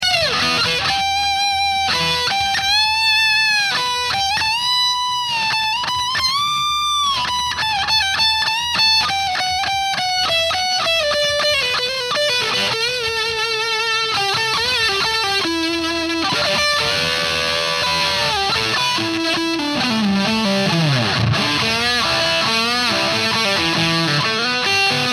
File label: Solo Power boost with no buffer in front